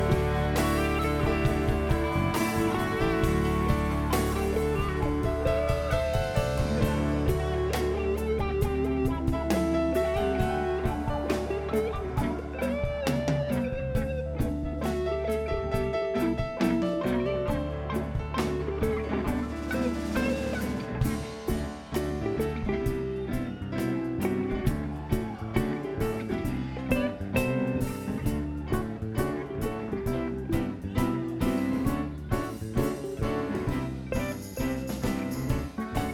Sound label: Jam; Front Porch Jam; The Buskirk Chumley Theater Bloomington IN USA